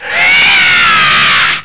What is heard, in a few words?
store